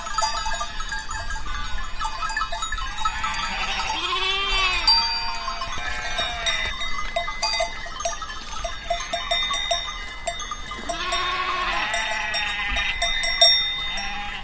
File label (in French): crete moutons